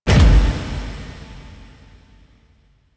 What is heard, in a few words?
Cylinder Start; Warframe; Entrati; Vaults